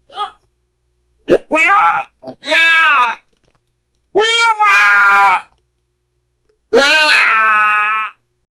the voice of laugh, movie; batman

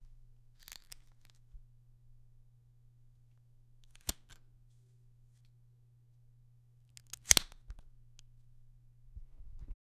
lens matte box opening, equipment

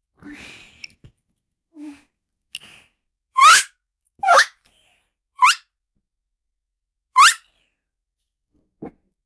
sex sounds; sound effect

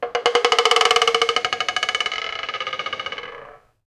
a large wooden wheel of, game show

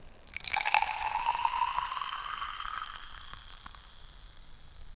asmr style milk pouring

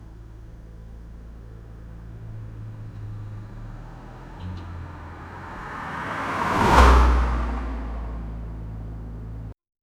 volvo brand car arriving

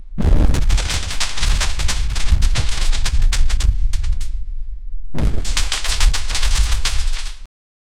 trees breaking stomping; dinosaur

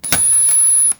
electric

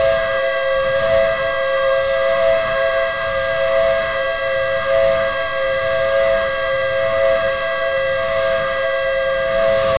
security laser; generate security laser; sound effect

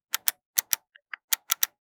game audio

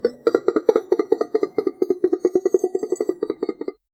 soft flour sprinkling, baking; tin

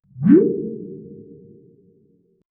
anime beam recall

